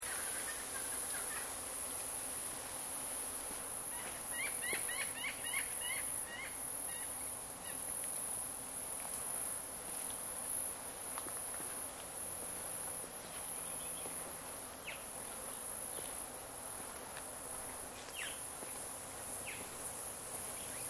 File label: AMBIENCE NATIONAL PARK FOREST BIRDS INSECTS LOOP; Noosa; Sunshine coastal area; Forests and Jungles